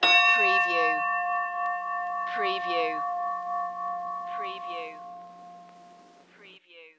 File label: Ship Bell One Ring